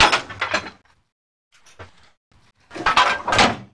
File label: arti reload; emplacement